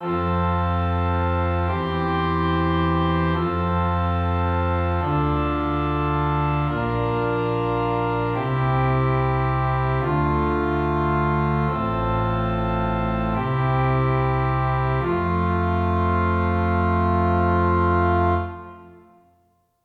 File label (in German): Stimmiger Contrapunkt; Ronge